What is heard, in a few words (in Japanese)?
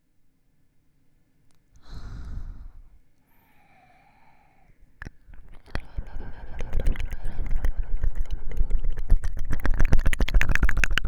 耳れろれろ強（右）